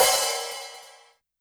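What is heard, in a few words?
Hi-Hat Open; YAMAHA RM1X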